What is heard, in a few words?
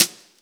BRUSHES, JAZZ